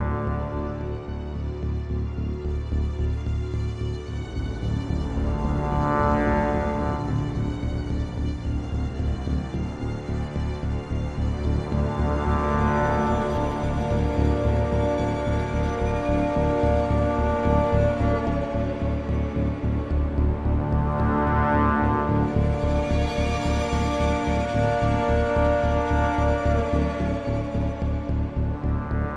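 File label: INTRO AMBIENT, soundtrack; transformers war for cybertron gamerip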